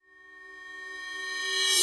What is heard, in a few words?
Reverse Cymbals; Vengeance - Essential Clubsounds Vol.5